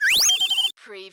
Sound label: CARTOON WHISTLES